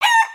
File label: cluck; creatures organic